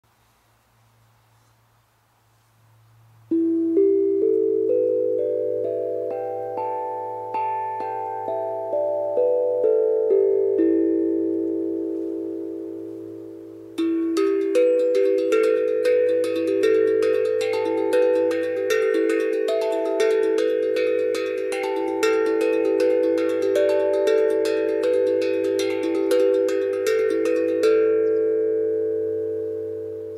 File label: E Minor Pentatonic scale